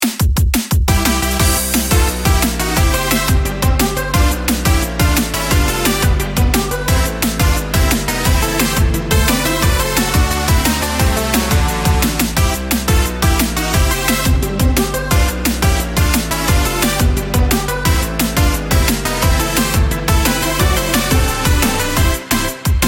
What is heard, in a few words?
Karate; Tokyo 2020